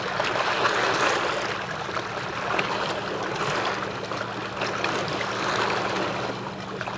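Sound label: water loop; Splashdown; XBOX; Soultree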